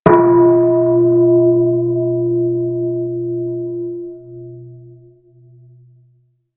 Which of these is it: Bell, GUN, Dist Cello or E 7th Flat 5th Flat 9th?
Bell